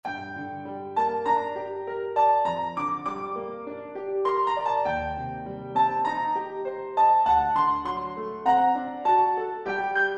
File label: G minor Fantasie Solo Version